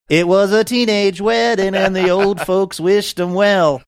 singing it was teenage wedding and old folks

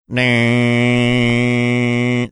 imitating dial tone sound

